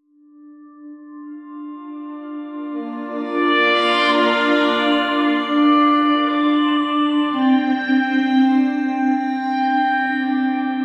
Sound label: calm music gradually turn; atmosphere